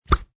Suction Off, Cartoon suctioning sound, suctioning material being pulled off; Funny Sounds; Comedy